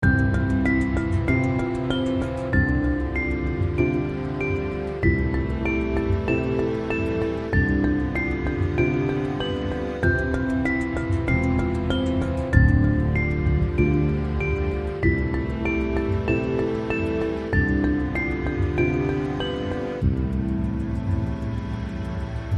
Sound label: Thoughtful Marimba